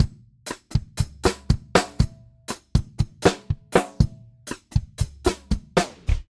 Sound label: Flanger Drums VSO Manual Mode Tape Stop